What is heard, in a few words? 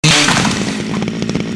chainsaw attack end